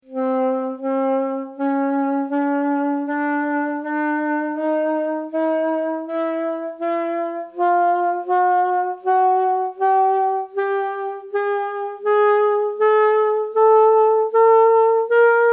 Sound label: Microtonal Scale